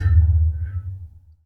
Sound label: Metal Resonant Deep Thud